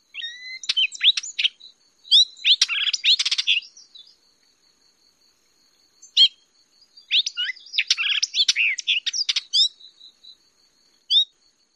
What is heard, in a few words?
song